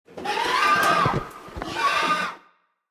boar death; npc; courier

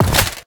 metalarmour equip; inventory

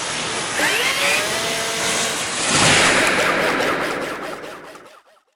homing missile enemy; weapons; dota; gyrocopter

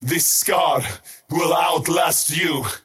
skywrath crown attack pain; skywrath mage

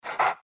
pant small, greevil; npc; courier

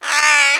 penguin; tusk

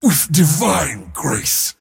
move pain; dota; mars

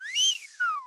surprised; dota; marci

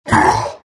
morph grunt; terrorblade

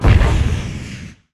attack dire, towers

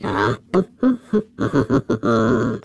hum, footsteps; creeps; neutral; greevil

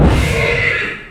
reality rift cast; weapons; dota; chaos knight

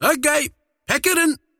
teleport; dota; largo

